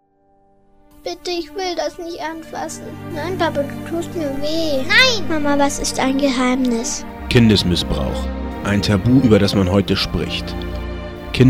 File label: Jingle; RadioSunLight; Missbraucht